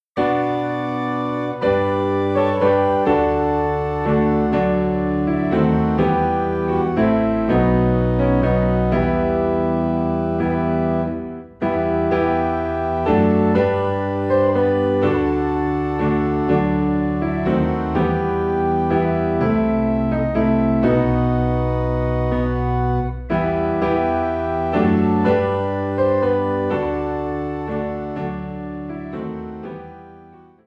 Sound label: Piano and Organ in E Minor; christmas; What Child Is This